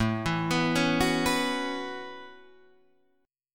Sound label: A 6th Add 9th A down Guitar Open D